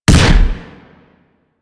Double Barrel Shotgun Fire Shot; Weapons

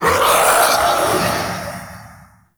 Mockery Male Vocal Pre Death; Characters; Enemy; Vocals; Mockeries

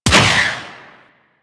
Space Turret Shot B; Warframe; Ambience; Space Battles

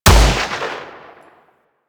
Gorgon Fire Shot, Weapons; Warframe

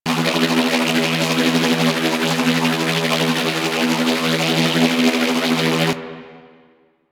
Corpus Ship Electric Rope Loop; Ambience; Props; Warframe; Corpus Ship Remaster

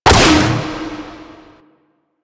Raptor Laser Barrage; Warframe

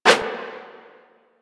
Sticky Bomb Idle Catch Whoosh; Grineer; Veilbreaker; Vor

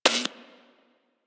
Bullet Impact Ricochet; Warframe; Weapons

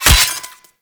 Melee Axe Impact Robotic Flesh; Weapons; Impacts; Axes

